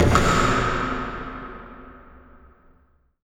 DBLHIT; AKAI Anime Sound FX

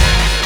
BOUND; E-MU Formula 4000 Series Vol. 1 - Hip Hop Nation; Wicked Hits

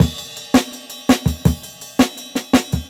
Jungle Breaks

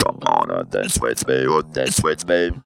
DANCE WITH ME, Funky Vocals; USB Soundscan vol.18; AKAI

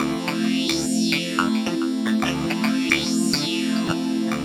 Clavbed, Pads; Sonic Foundry (Sony Creative Software); Synchro Funk

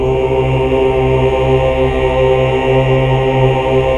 AAH 2 3 L; Best Service Gigapack 1

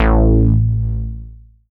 FROG BASS; Masterbits; Dance Trax

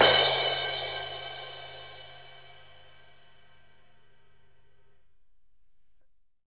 CRASH15 FF, Drums; Big Fish Audio; Ross Garfield Drums VOL-2; SHILOH KIT

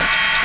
STEAM PRES; MACHINES

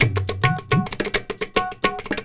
India, Heart of Asia

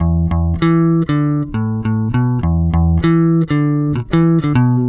NUTS 98 F, Bass Grooves; USB Soundscan vol.30; AKAI

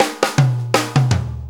Shuffle Fill, Drum Tools

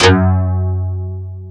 DS8 F#1, Korg; Back In Time Records; Korg Universe VOL-1

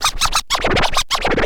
scratch short, Vinyl scratches

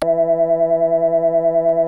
JUNO 60 E4

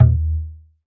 Buk; Roland BK-5; Percussion

